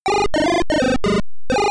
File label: Level Complete (IBM PC-Speaker); Supaplex